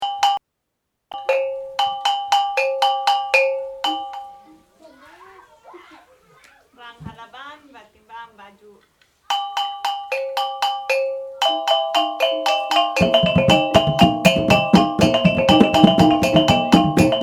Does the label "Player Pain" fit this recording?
No